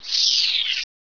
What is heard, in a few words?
cricket jump; gearbox